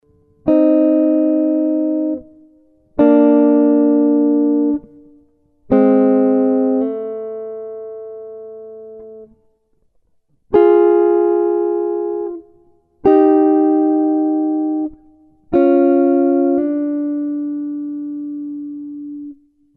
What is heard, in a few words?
guitar